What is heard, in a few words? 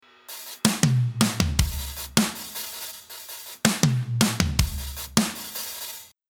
Hip Hop Groove; Crossover Core Vol. 1